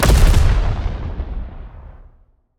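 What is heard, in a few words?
naval gun, impact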